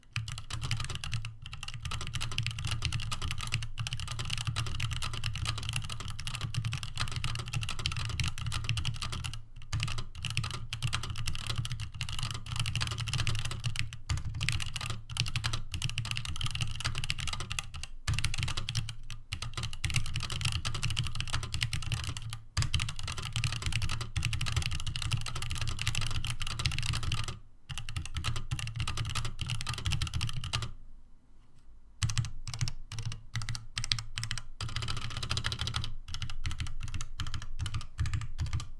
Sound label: typing sound test; Drop Sense75